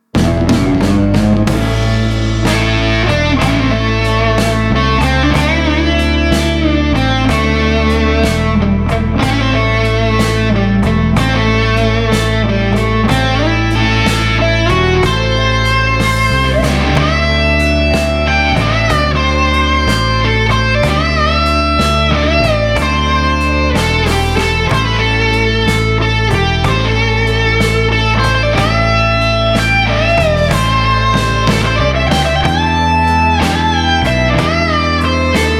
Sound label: Traditional Blues, country; Traditional 12 bar major blues features vintage sounding solo guitar will be perfect for your video.